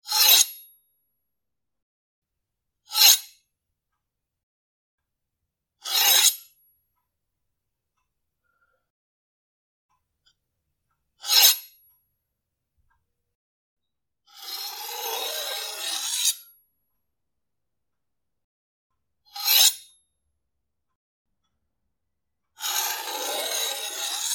Carving Knife Scrape Sounds For Sword Draw And Sheathing Sounds